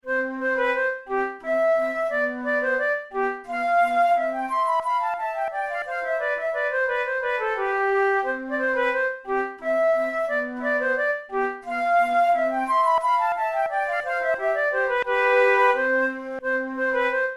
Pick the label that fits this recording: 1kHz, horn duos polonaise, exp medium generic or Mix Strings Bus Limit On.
horn duos polonaise